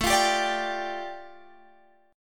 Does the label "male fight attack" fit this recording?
No